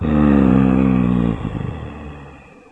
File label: neutral, zombie